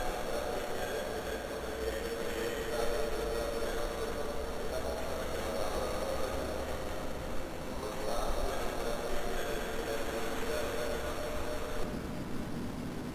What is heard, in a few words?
Train Ambiance; Who's Lila ost 2022